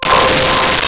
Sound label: Bowed Glass C5; Nintendo; July 2020 Gigaleak